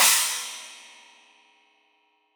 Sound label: China, Crash, China Cymbal